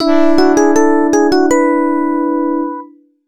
Success; Nobunaga no Yabou Zenkoku Ban; X68000

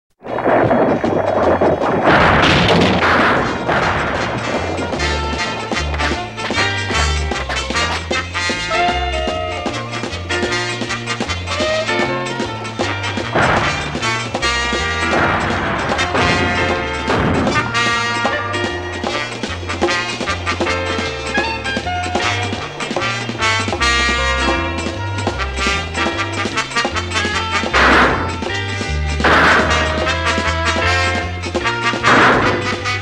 GALLOP SAMBA; Lupin III '71 ME Tracks

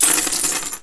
player pickup Cash; SAS: Zombie Assault 4

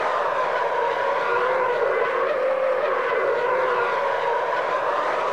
Drift Asphalt; boom karts multiplayer kart racing android

